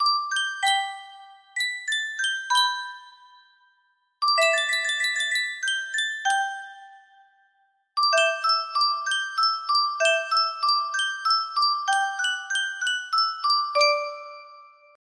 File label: sankyo custom tune music box, music box melody